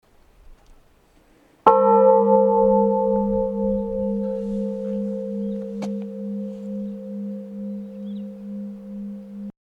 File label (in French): cloche; Vernet les Bains, cloche 1